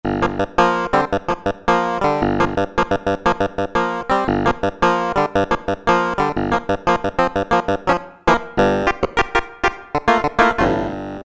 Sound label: Old Clav; Roland PN-D50-02